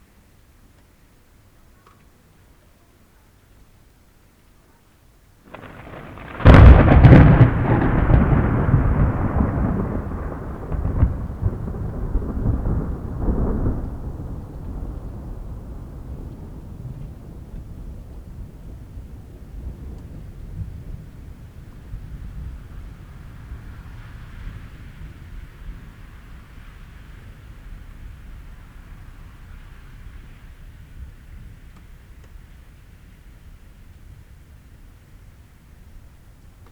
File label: thunder; R09; AT822